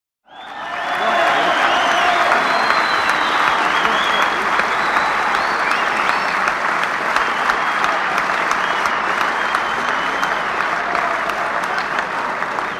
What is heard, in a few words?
Applause; BBC